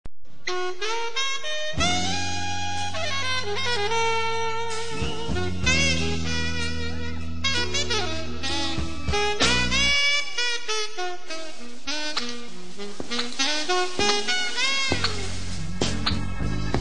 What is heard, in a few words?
blues